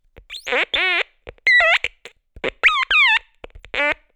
Cracklebox Rhythmic Squawks

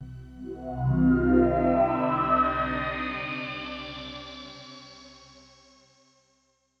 Power pad

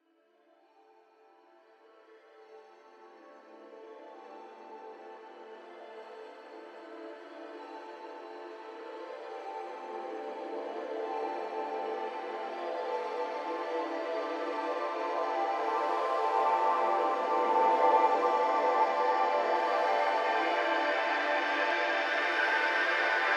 Ethereal Riser

